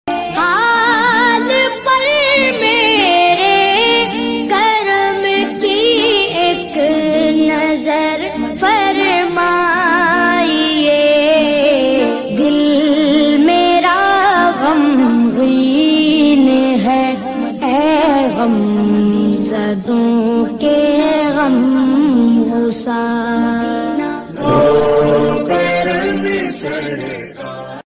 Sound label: islamic ringtones